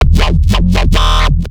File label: Growl Bass; neuro; BIG5AUDIO